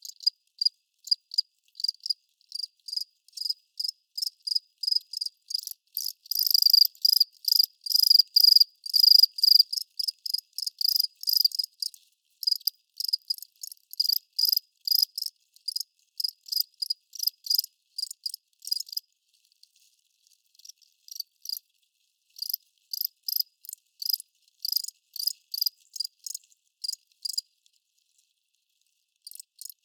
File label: crickets; Terrarum; ambient